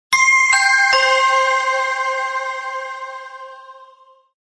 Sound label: bell